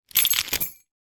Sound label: household bunch of house keys movement